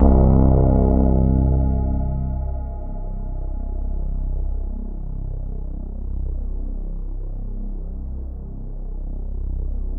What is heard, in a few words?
C1, Synth Line; 90 s Sample CDs; NiceBeats; SynthLine VOL-31